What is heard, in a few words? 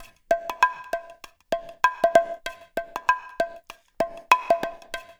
loop, bongos